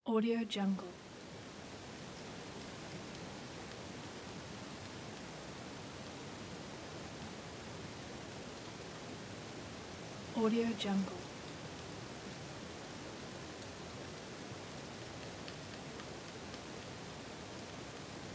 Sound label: winter